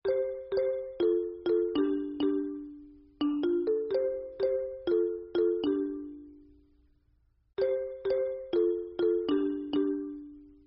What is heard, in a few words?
ringtone